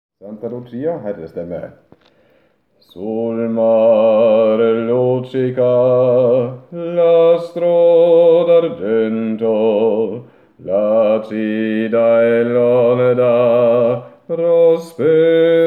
Santa luchia - tenor og bass

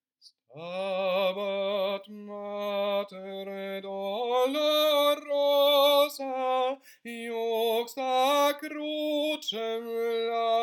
Stabat mater sopran

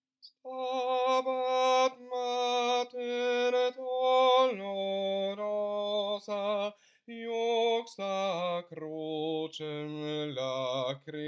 Stabat mater - bass

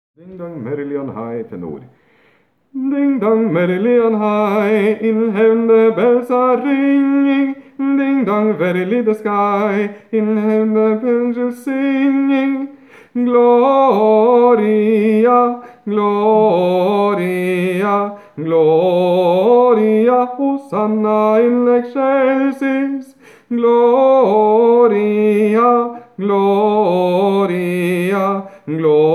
Ding dong merrily on high - tenor

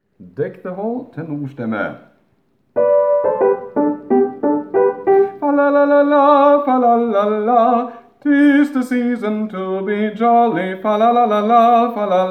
Deck the hall - tenor

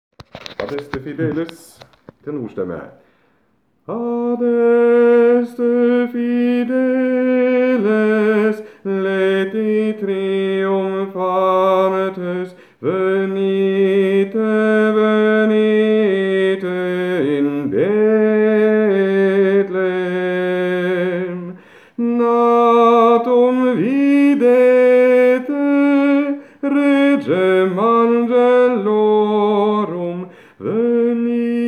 Adeste fideles - tenor

